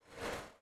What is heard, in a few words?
Mech Footstep Texture Rewind; starbites